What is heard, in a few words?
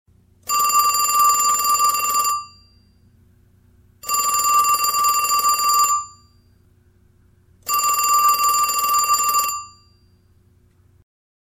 Long Ringing Ringtone